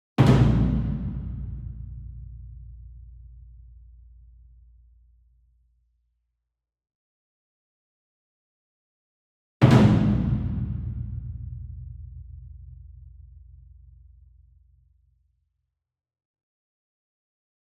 Gong Double Hard Beater Far; SINGLE DRUM; boom library; Cinematic Strikes Construction Kit

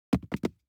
DICE on Neoprene, Throw and Roll, Foam, One Die; Samples; ArticulatedSounds; DICE Sfx Party (Extended)

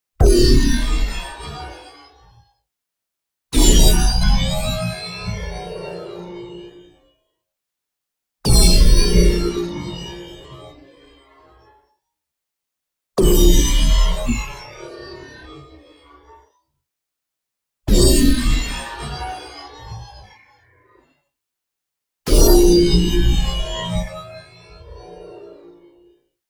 PING Light Refraction; boom library; Neon

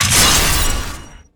Pickup Sword Powerup

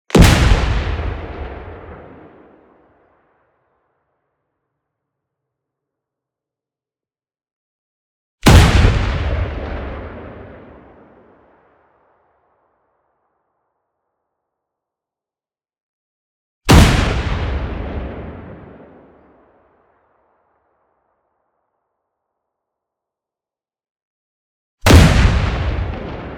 EXPLOSION LONG Clean and Soft; boom library; Anime Essentials

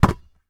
Street Ball Slap Ball Block; SFX Kit

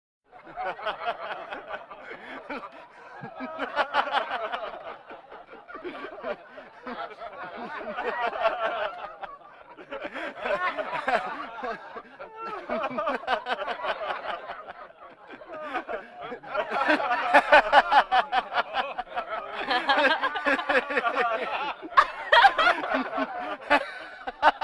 Vocal effects breathing Moaning Baby; Valentino Production Sound Effects Library CD50

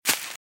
Velociraptor - Footstep - Grass - Run - Left

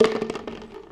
Roll Popcorn Bucket; SFX Kit; Foley - Misc

